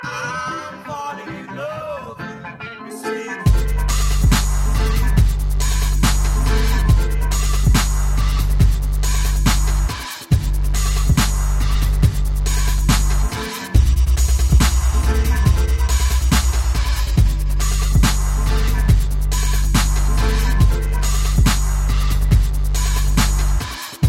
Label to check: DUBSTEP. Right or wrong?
Right